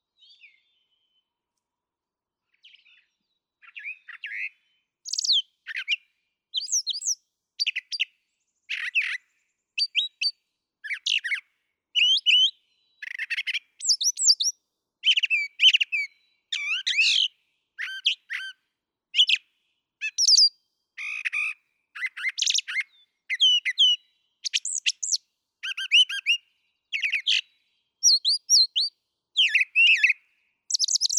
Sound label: Brown Thrasher